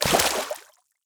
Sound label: water jump up